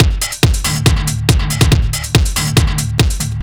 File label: Overdrive, Drums Beats